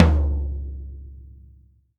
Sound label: Tom Floor Hardest; hydrogen drum samples